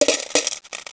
Coin Clunk; Princess and the Pea; SoftKey

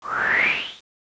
Whoosh

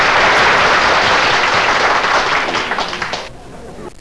applause

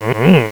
huh

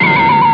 Kick Pwang; AmIRCsounds

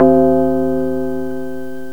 tubular bell, chromatic percussion

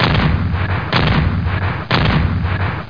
Cannon

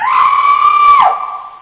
SHRIEK; usnthem

